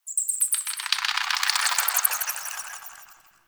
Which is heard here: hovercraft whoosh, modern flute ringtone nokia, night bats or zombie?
night bats